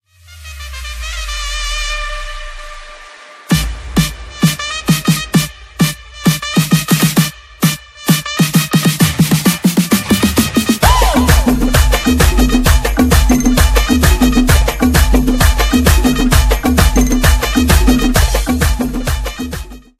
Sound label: Tribal Dance